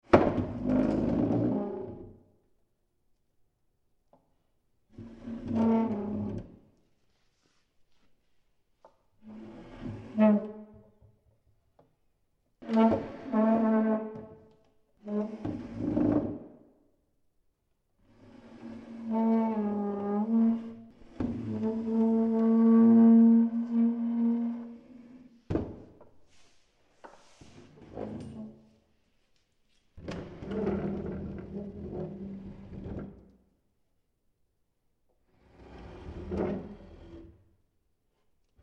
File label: Chair Slides Various; Objects; Tom Hutchings